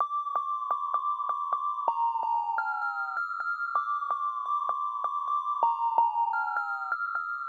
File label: lead; whisper